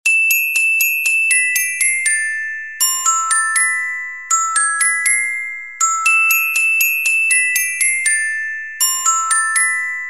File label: glockenspiel Glockenspiel; per elisa